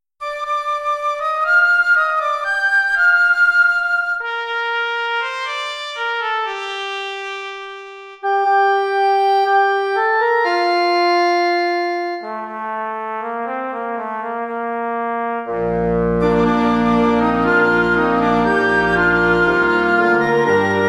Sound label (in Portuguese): orquestra; no outro ha um cristo